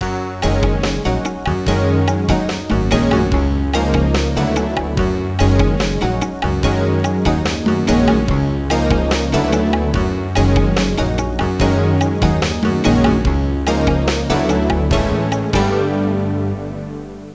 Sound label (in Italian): suonerie musica jazz